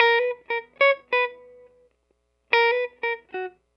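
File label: 095 Chill Pop Guitar Melody Bb major, Chill Electric Guitar; Pop Rock; Pro Tools Essential Loops; Digidesign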